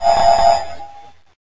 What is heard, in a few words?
Bug Buzz